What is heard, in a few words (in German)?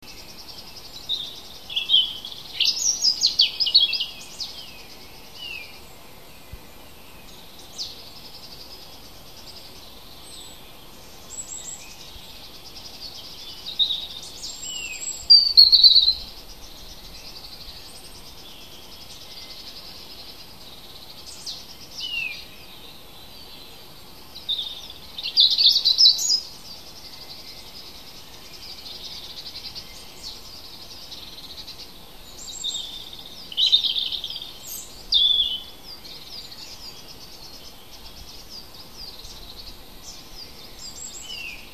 vogel heimisch; biologie; hecke; april